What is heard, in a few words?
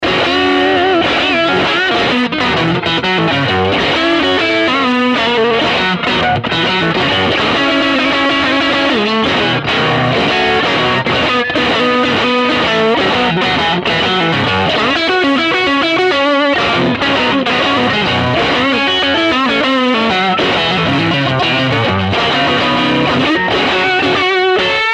neck pickups; SRV BLKVibro